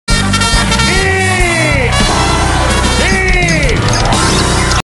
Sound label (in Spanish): de la trompeta